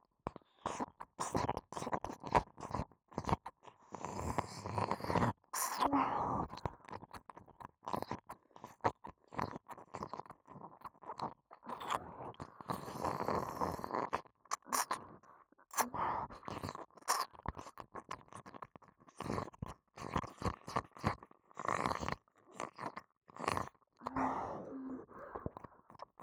舔耳 激烈

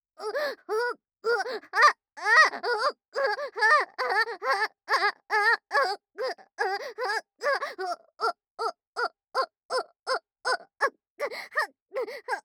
娇喘声 激烈

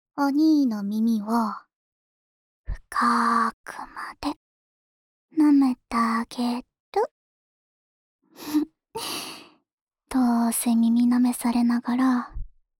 深深地舔耳, ASMR

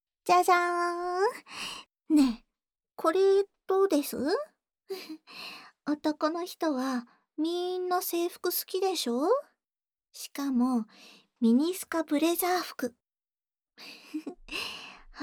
緊貼耳朵呼呼_左, ASMR

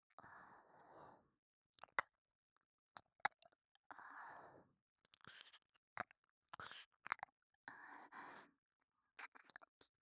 舔耳 普通

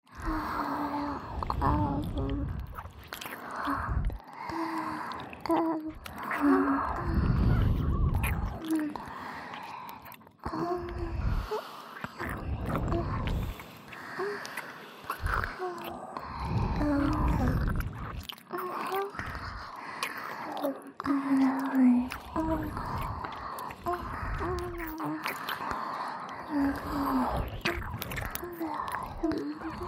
舌吻 ＋ 雙耳 接吻 舔舔; 附錄迴圈（自慰輔助）; 3WAY迴圈

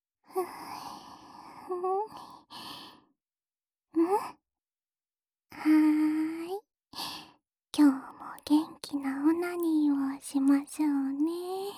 双侧舔耳循环音轨 位置反转; 栉形与稻丛的自慰辅助用双侧舔耳循环音轨

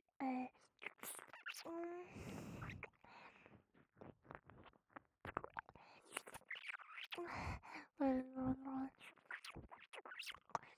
舔耳 激烈

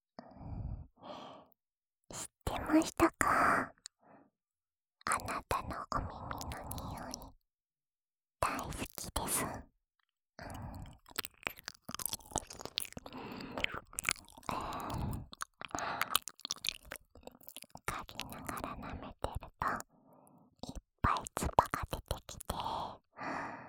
深深的舔耳（声道翻转）

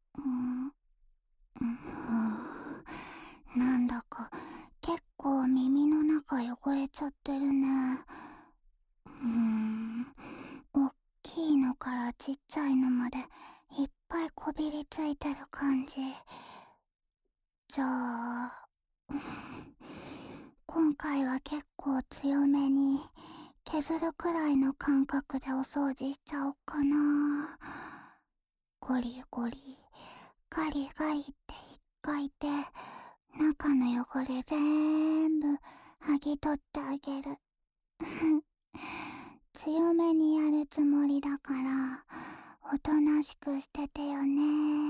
安眠用 刮蹭 掏耳 循环（右耳）

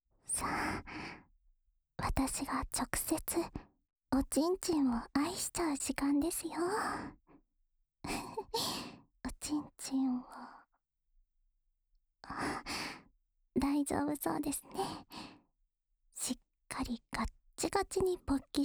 稍含唾液的舔耳手交（右耳）

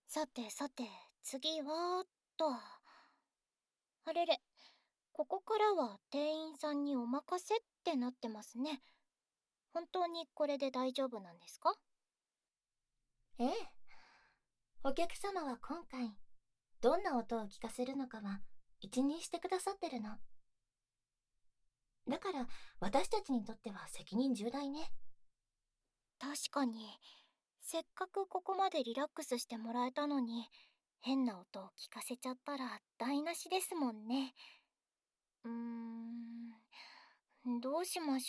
黏土掏耳与耳中气泡声的ASMR催眠(効果音なし)

